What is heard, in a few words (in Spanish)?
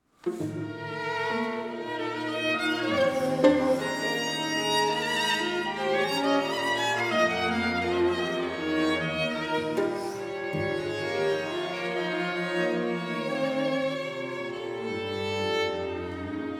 Cuatro miniaturas para cuarteto cuerdas